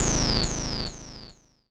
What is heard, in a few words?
KEY SHOT TEKNO 140 A; Techno2; Singleshots